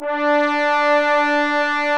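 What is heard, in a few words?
bones, Brass Orch Ensemble; Roland L-CD702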